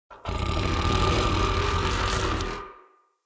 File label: Battle Dog Intimidate Growl, Animals; Vocals; Snc Battle Dog